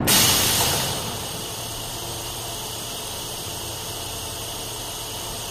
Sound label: Jpl 70 Space Sim Clos, pneumatic